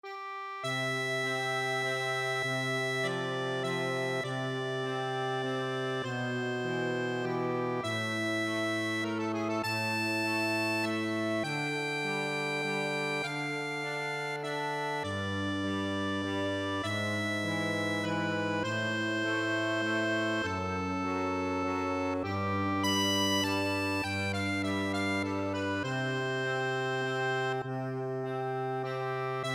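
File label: nocturne, accordion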